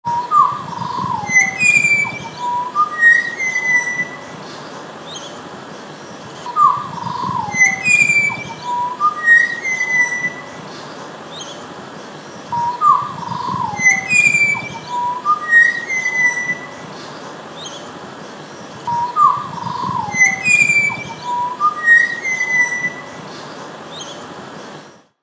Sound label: Tuneful Bird